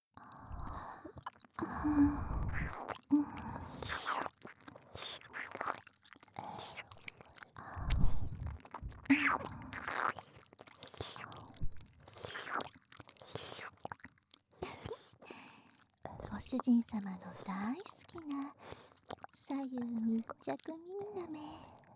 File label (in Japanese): ひたすらW耳舐め; メスイキループ; メスイキサポート